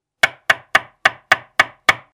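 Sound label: knocking sound; zvuk stuka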